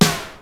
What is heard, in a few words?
DRUM STUFF, ENSONIQ VFX; Rubber Chicken Software Sample Bank